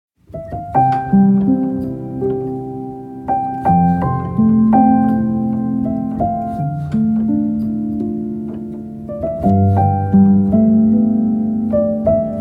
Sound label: piano; Chichiro